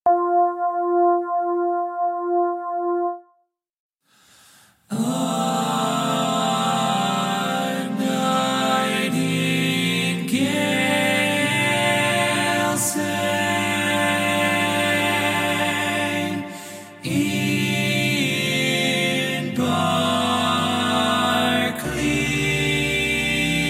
tenor

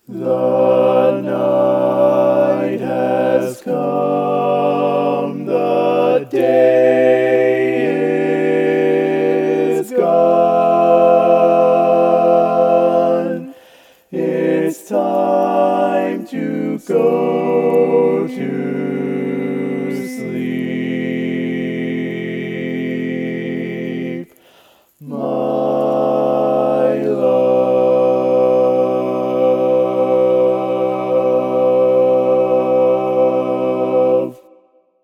barbershop tags, all parts

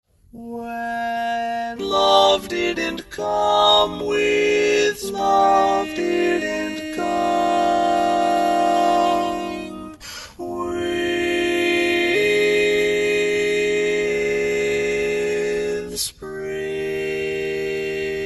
Tenor, barbershop tags

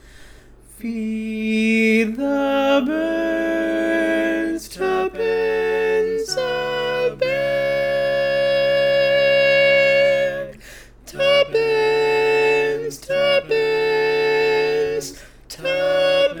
Tenor, barbershop tags

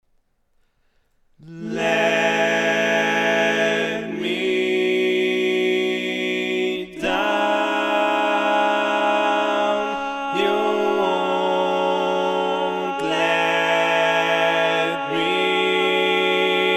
All Parts, barbershop tags

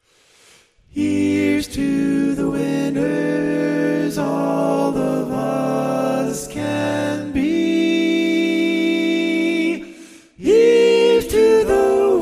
Tenor, barbershop

